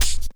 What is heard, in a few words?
PERC, Drum & Bass; Ueberschall; Drum & Bass Resonance